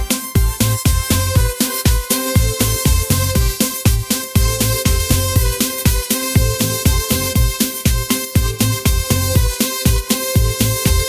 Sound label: pop groove